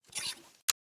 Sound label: wire; svencoop; ins2